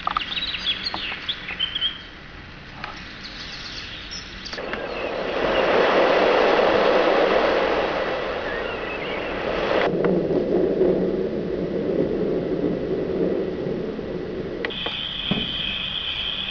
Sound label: clock